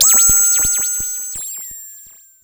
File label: Cwejman S1; Might Hight; DevSnd Cwejman S1 - RD